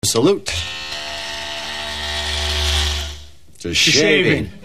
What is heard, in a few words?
shaving